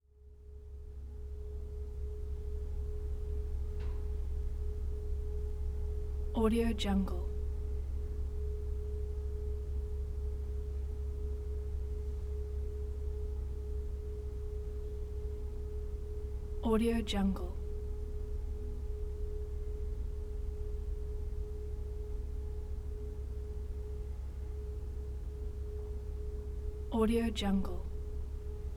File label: hotel corridor quiet night ambience